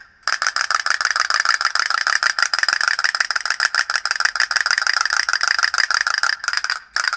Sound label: castanets; gcompris; instruments